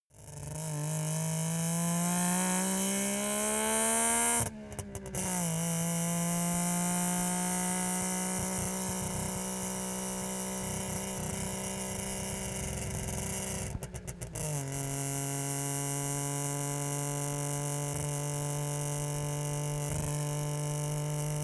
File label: car saab 92 accelerate soft decelerate exhaust